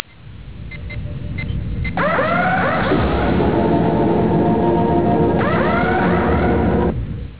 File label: shuttle